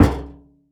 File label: Garbage Can Set Down On Wood Bottom; Household